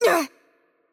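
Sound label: Brazilian Portuguese HurtLight; VO; bloom minigame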